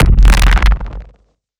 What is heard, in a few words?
Monster spotted you